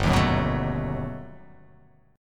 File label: G Augmented Major 9th